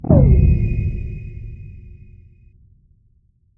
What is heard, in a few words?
hunger blade slash; weapons; dota; kez